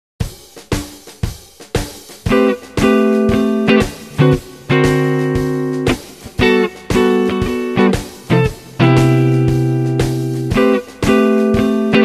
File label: Rhythm Guitar